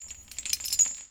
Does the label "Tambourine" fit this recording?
No